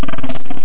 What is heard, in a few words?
BELCH